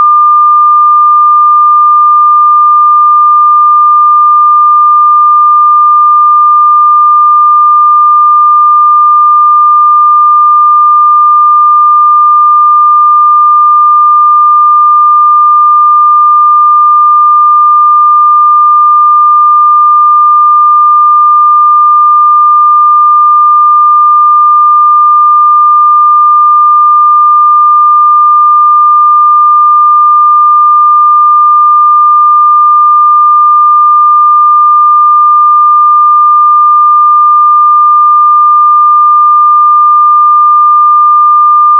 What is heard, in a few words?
1200Hz tech trigger